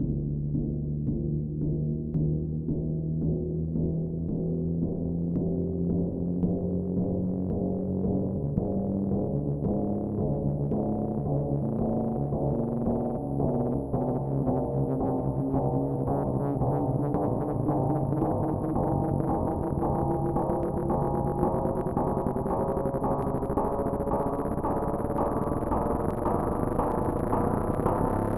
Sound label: uplift sweep synth